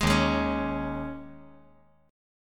D sharp 7th Suspended 4th

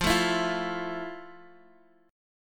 F Major 7th F, Guitar, Standard; strummed; JGuitar

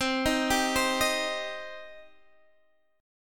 C Minor C down Guitar Open D

